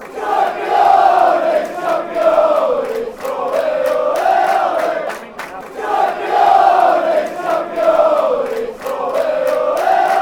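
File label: championais, football chants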